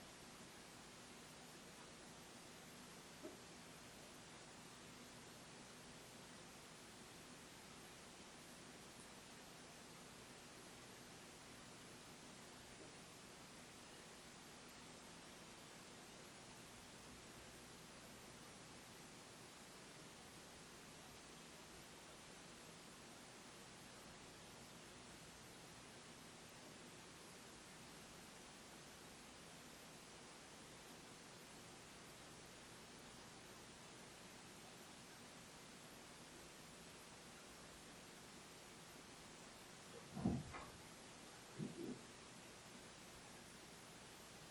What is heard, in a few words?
Inside Tattoo Shop